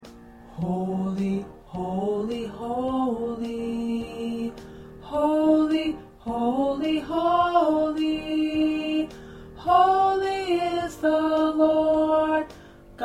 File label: Tenor, choir parts